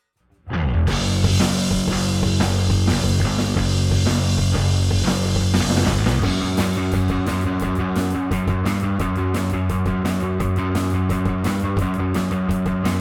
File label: Drums and Bass Post Bass Comp